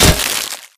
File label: imp bullet generic headshot android; Titanfall 2